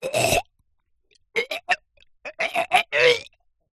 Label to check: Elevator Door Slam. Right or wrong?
Wrong